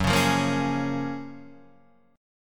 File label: Gb Major 9th, down, Guitar, Standard; strummed; JGuitar